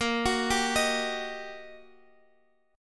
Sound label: G Minor Major 7th Sharp 5th, Bb